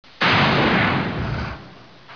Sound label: explode